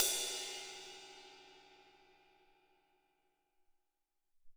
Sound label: Amb Ride, Alesis SR-18